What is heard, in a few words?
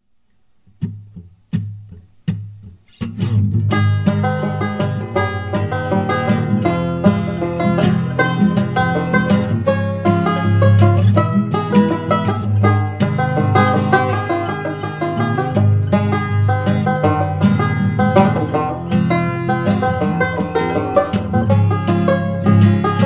banjo